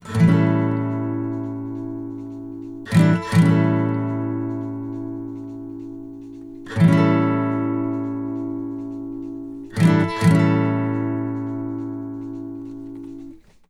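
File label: Country Guitar Intro; Digidesign; Pro Tools Essential Loops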